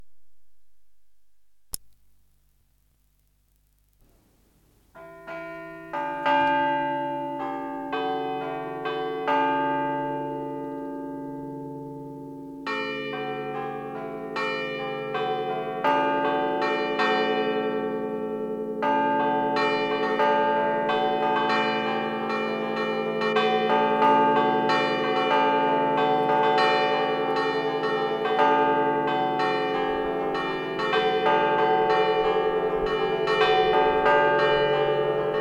Genova San Cosimo di Struppa, Campane; Liguria; Archivio Balma Campane Liguria; Polifonia

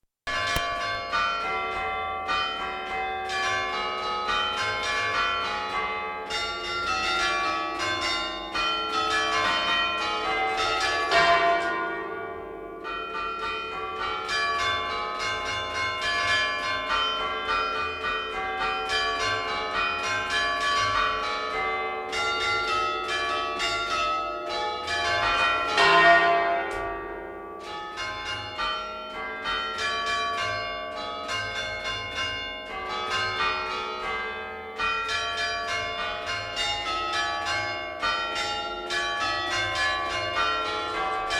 Montanesi, Campane Liguria; Genova; Archivio Balma Campane Liguria; Polifonia

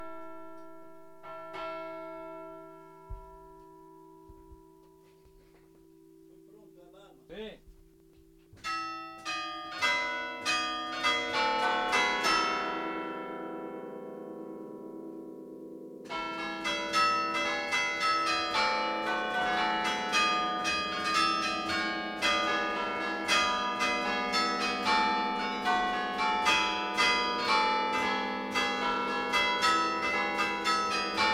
Genova San Quirico, Campane Liguria; Archivio Balma Campane Liguria; Polifonia

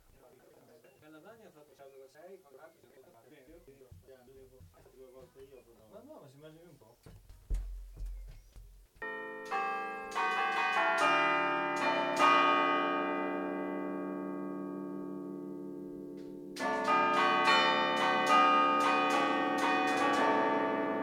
Rapallo, Campane; Genova; Liguria; Archivio Balma Campane Liguria; Polifonia

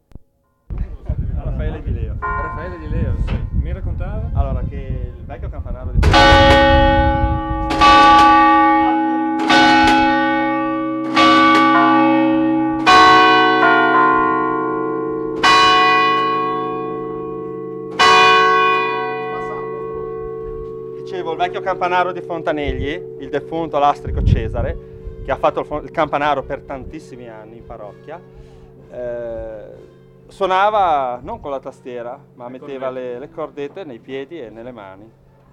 Genova Fontanegli, Campane Liguria; Archivio Balma Campane Liguria; Polifonia

